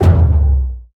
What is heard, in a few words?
damage hits explosions